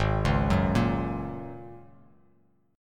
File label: Ab 9th Suspended 4th Ab down Bass Standard; Ab9sus4 arpeggiated; JGuitar